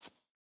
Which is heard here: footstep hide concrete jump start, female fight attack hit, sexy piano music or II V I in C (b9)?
footstep hide concrete jump start